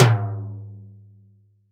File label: Timbl Lo, Roland SPD-S